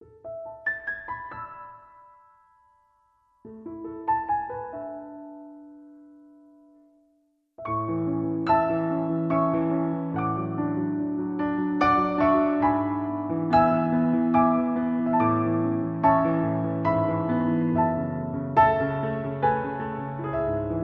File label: Music Box